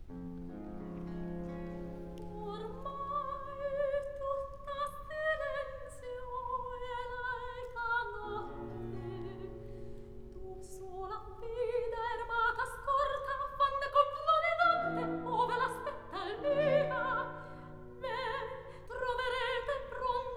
Recitativo; Act Two, Scene 6; Ormai tutta silenzio; Floridante

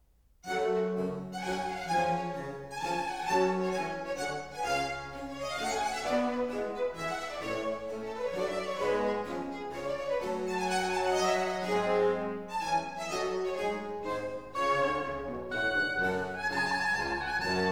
Concerto for Oboe in G Minor, Allegro; Handel

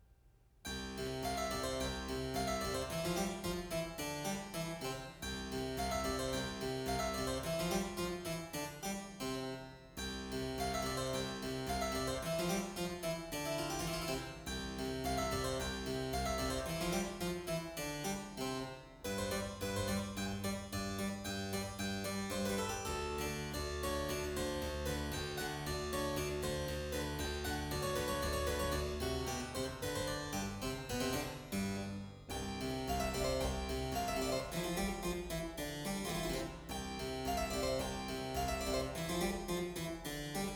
Musette in A Major; Bach; Anna Magdalena Bach Notebook (highlights); 尼古拉斯 · 麦克吉根

